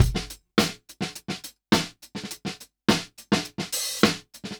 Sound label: Beat Loops, 104; Zero-G; Monster Beats